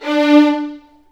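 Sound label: D4 violins; The Ultimate String Collection; East West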